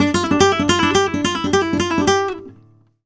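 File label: Guitar Picado, Flamenco, g minor; Picado 2; Best Service ProSamples vol.46 - Flamenco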